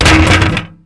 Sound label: metal; Mafie 1